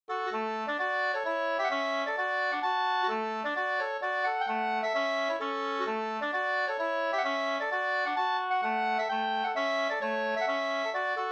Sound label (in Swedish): hambopolska, 2st; Ahlstrom147